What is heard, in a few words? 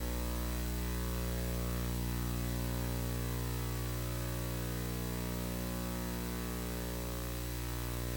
Mod Noise 18dB; NESA